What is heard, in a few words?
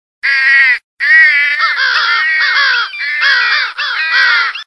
Crow Frenzy